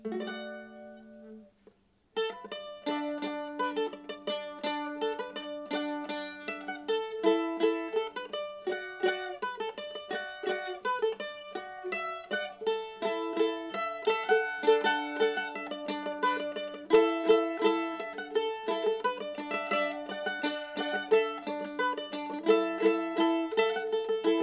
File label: old dan tucker bent top mando